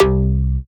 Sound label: Synth Oneshot C